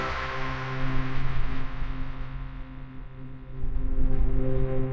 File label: victory horn; sound effects